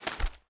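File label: weapon foley assault rifle reload fast end; gmod; viper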